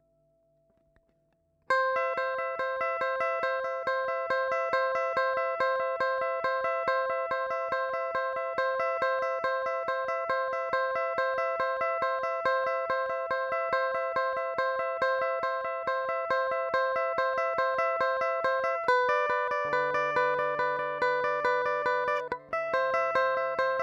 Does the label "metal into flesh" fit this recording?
No